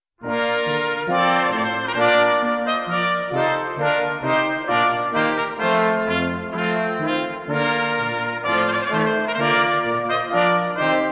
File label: trumpet voluntary, brass bands; clarke